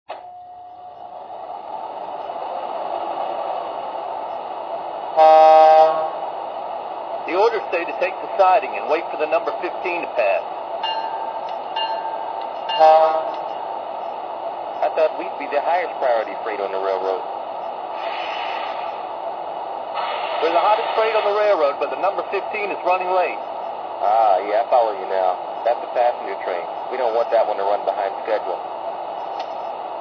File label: gg1; cr